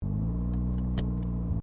Car Mazda RX7 Series 3 Interior Idle Seatbelt Clip; 1983; Cars; Vehicles